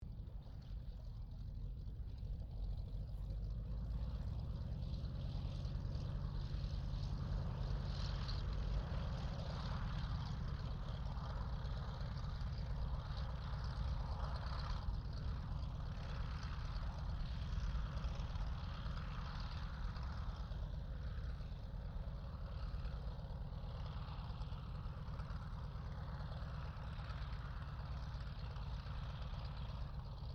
AIRCRAFT C195 SQUADRON 2 TAXI DISTANT, Squadron of 2 Cessna C195s; Airplanes (Sound Effects:Vehicles)